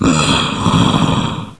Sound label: zombie heal; nst zombie